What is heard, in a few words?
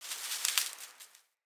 Wetland Forest Day Vegetation Scurry; Midrath Island; Ambience